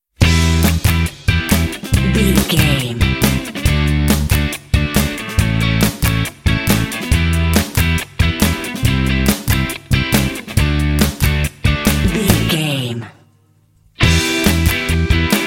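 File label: indie trojans rule no acoustic guitars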